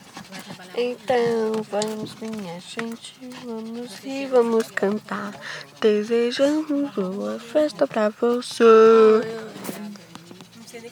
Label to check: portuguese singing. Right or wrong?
Right